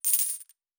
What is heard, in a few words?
Coins; Fantasy Sounds Bundle